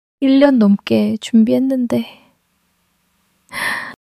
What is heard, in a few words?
crying; monologue